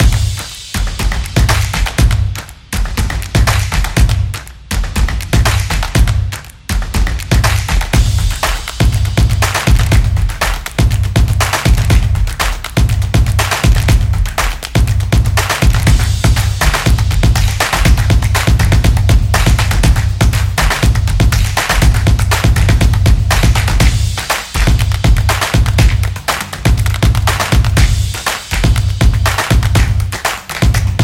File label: Quick